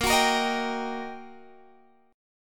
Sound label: G Minor Major 7th Sharp 5th, Bb